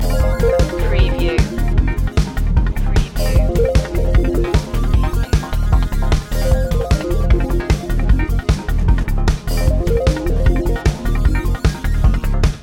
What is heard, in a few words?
information age loop